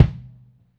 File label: LOFI KIK, LO-FI KITS; Spectrasonics - Burning Grooves CD2